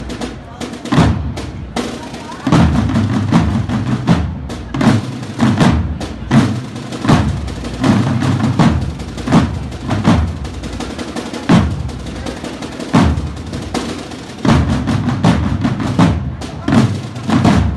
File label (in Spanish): Magdalena Marcha Popular